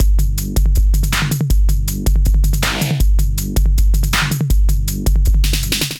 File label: Kit Melodic 160 bpm, Drums; MIDI Clips; Delicate Chaos Kit; Ableton; Factory Packs; Skitter and Step